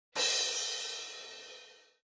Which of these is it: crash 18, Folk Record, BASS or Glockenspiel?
crash 18